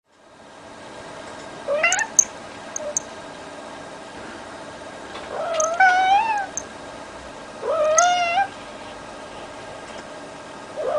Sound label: meow; maro